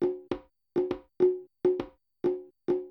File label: Conga Drum